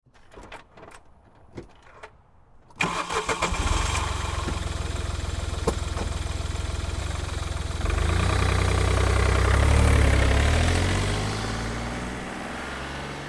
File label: Car Smart Car Start Away Med; 2005 Smartcar Fortwo; Vehicles; Big Room Sound